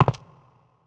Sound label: step; GoldSrc